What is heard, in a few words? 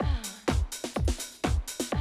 125, Classic Drum Loops